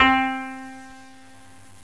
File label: koto; GMTones; Ethnic